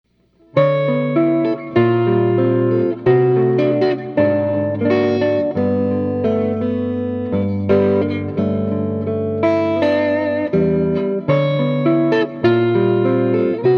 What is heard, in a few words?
chord progressions pedal on Overdrive